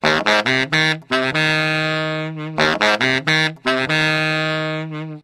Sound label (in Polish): Saksofon